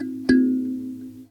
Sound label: Indonesian Thum Strike